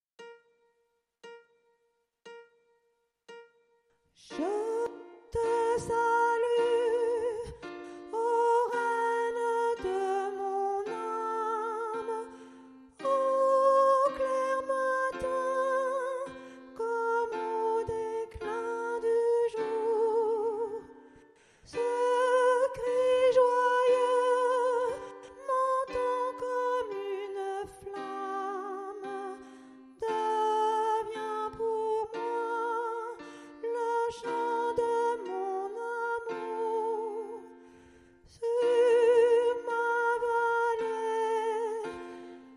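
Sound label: soprano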